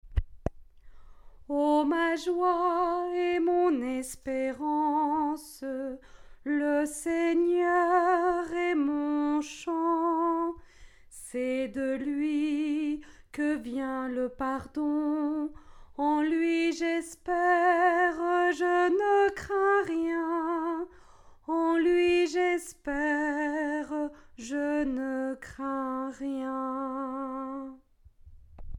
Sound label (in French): ALTO, atelier de chant a plusieurs voix